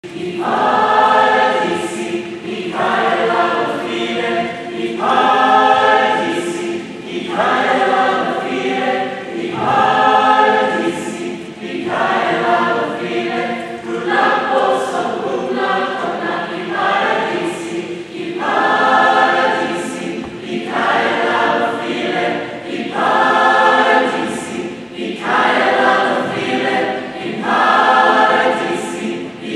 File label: Tutti; Ipharadisi